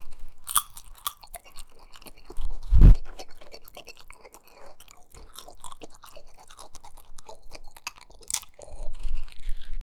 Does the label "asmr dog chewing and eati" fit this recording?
Yes